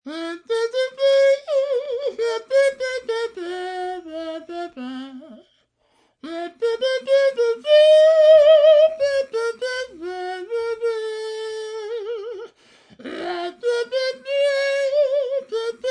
Trumpet Muted, Vocal instruments, trumpet with harmon mute, sultry (human voice); Singing; Sound Effects:Voice; Voice Box